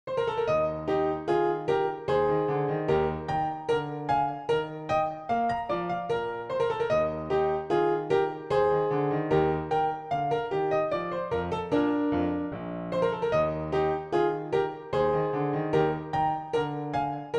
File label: Dance PianoTeq Bechstein